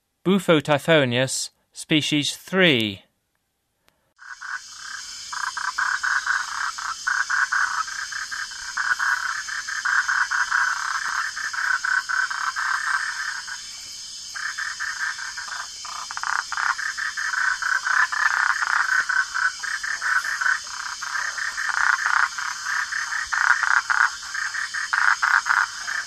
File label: Bufo typhonius, Frogs of Ecuadorian Amazon; S. America